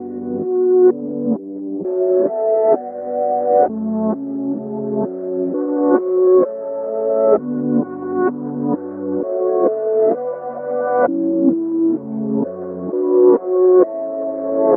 130 synth pad chords Bm; take

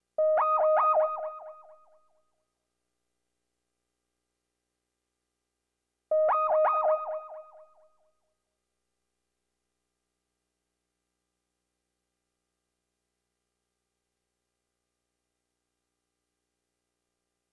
LEAD

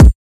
KICK

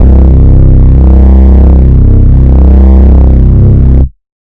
Bass

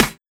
Snare

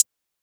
Hi Hat

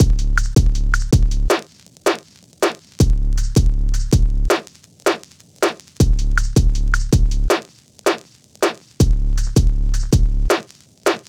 Drums 80bpm Drum Loop Hip Hop

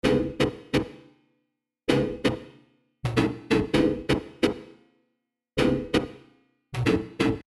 Rave Stab

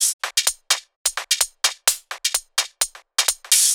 Drum Loop; Evolution Of Sound

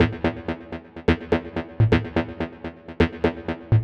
125 Drum Kit

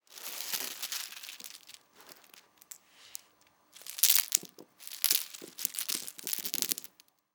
Foley, Cereal, Honey Combs, Sweep, Drop

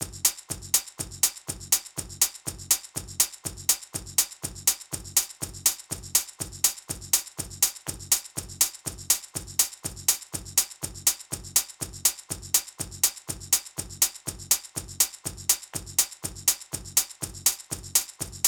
Top Dm 122

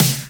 snare

